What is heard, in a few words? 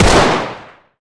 fire, glock; Urban-Coalition; Decay; arccw ud